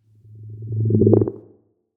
Copter, Whooshes

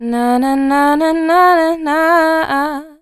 Nanana, Chants, Vocals

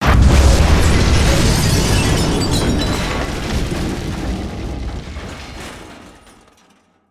explo bomb; Explosions; PremiumBeat

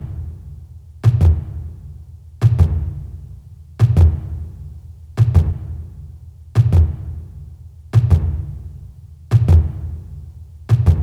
Perc Loop - Am 87Bpm; Construction Kits; STEMS; Ghosthack; SkyRealm; Kit 18 - Shivering Sea

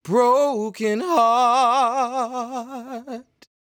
Sung Phrase Dry, 80 BPM B Maj, vocal; Broken Heart; Cymatics; Euphoria Vocal Sample Pack

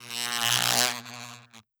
electric fx demagnetiser

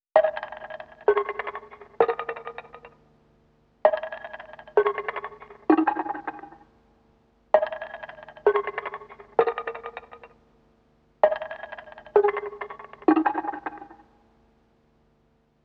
130 BPM G# Min Bell Hit, loop stems; Melody; 2022 Melody Collection Platinum Expansion - Pt 1; Cymatics; Louis Vuitton

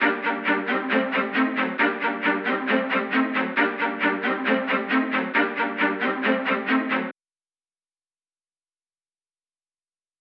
135 BPM G Min String Low, loop stems; Trap; Cymatics; Opus; 2022 Melody Collection; Trap Pt 2

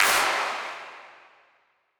Stadium Clap; House; Drum One Shots; Claps; Essential; Cymatics

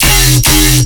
Bass Hit F, Dubstep

